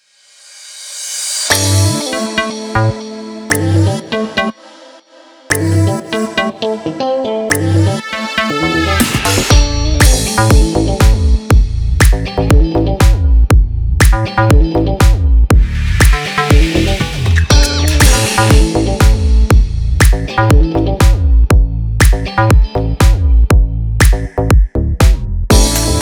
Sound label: deephouse funk; by burak sound bank; deep house vol 5